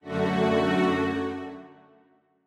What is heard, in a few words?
Strings end